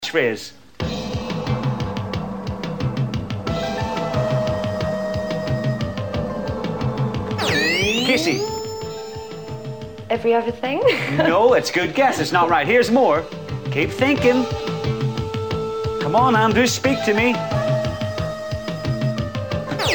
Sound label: Cowboys And Indians Jingle From The TV Show; Catchphrase